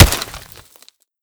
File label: bullet hit, bullet collide; STALKER; IWP